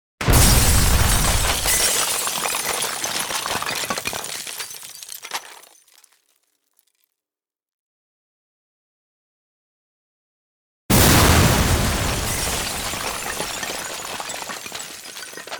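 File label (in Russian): Подрыв здания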